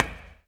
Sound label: step, glass; gameplay; materials